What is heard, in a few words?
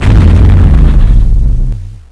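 explosion; gusanos promode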